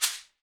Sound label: SHAKERS; Spectrasonics; Liquid Grooves; SHAKE IT UP